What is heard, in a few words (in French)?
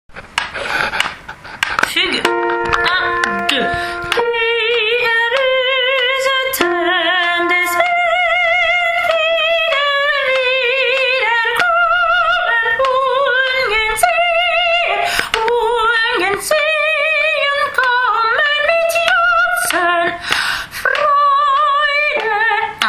Brahms requiem allemand soprano debut de la fugue